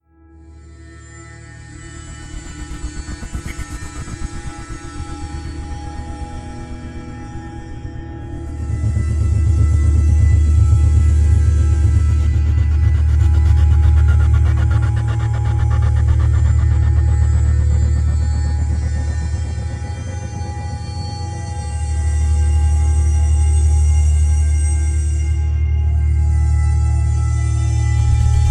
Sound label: suspense, media; svencoop; echoes